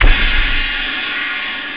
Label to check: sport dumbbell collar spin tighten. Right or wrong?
Wrong